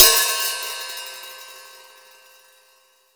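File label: MIX open hi-hat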